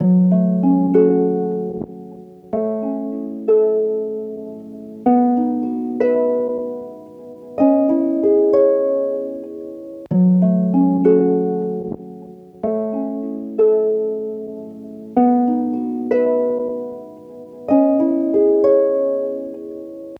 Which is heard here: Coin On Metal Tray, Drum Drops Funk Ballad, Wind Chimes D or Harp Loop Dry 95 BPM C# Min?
Harp Loop Dry 95 BPM C# Min